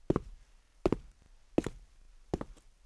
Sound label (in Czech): kroky; Mafie 1 cz